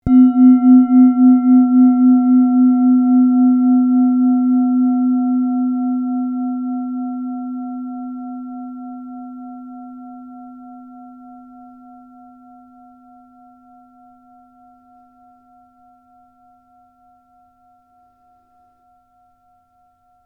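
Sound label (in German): klangschale sonne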